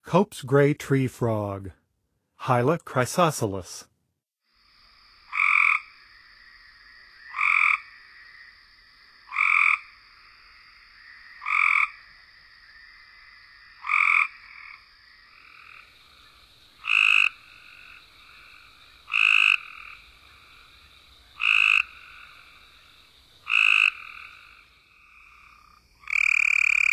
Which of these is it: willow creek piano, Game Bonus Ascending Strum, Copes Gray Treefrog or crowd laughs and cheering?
Copes Gray Treefrog